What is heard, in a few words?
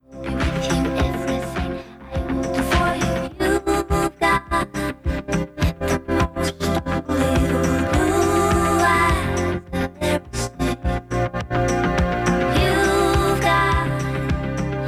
finger, theremin pitch popper